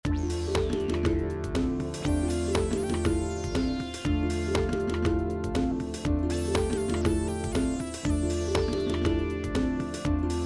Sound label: MIDI